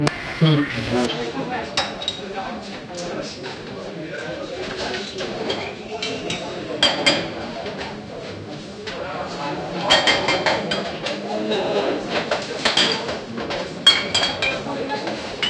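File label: bottles set on wooden table, tavern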